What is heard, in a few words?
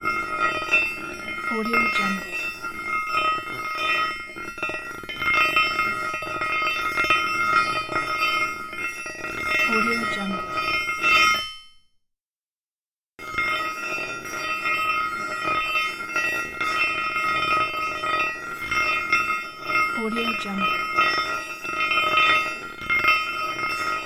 metal drag slide fast